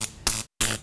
stalk sparks; Half Life 2; Episode 1; citadel

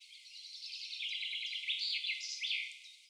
birds 1shot

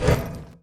ladder footsteps; player

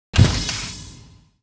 jump ability; Counter Strike Model Pack; CS:GO; survival

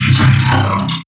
dry, strider, combat, movement grunt; Half Life Alyx

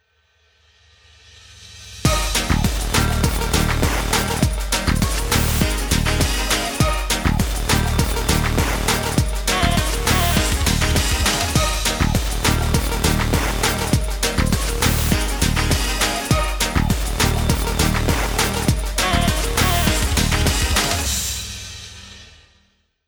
start action, music

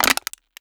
tin can, crushables; oneshot; Half Life Alyx

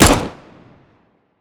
usp unsilenced, weapons; Counter Strike Model Pack; CS:GO

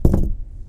ceiling tile step, plaster; physics

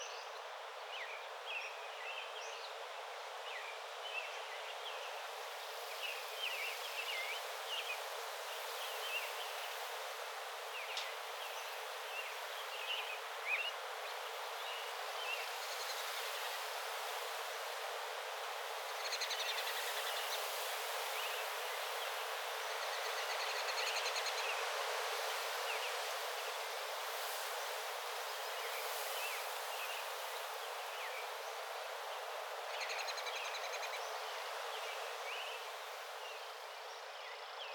exterior; inferno

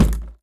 impact, luggage big; physics; props

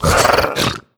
shake; creatures headcrab reviver scripted intro

